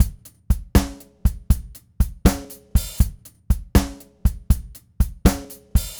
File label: Rocky Mountain Groove, Drums